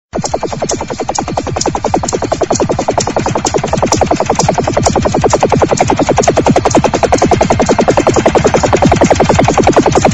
Helicopter

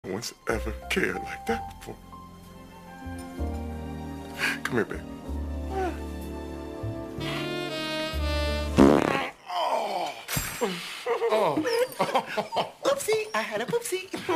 Farting

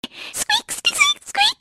squeaking

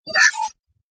Blip; Omni Tool

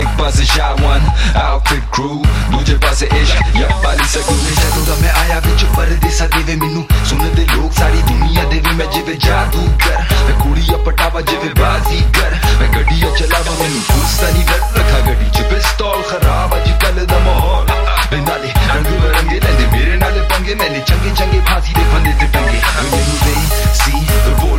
Punjabi Rap

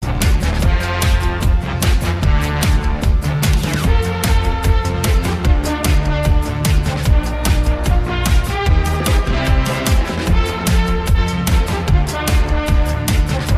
Brass

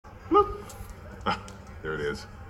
bloop; Raymond holt

